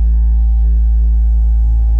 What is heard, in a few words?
puck